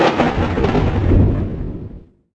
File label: THUNDER